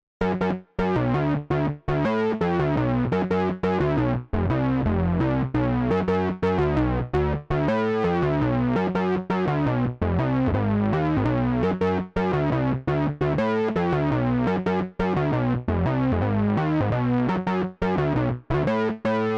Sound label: Bass